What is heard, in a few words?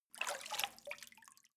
step water sneak out